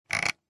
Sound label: Computer mouse roller